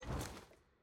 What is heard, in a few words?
sfx trans collection return click; jayce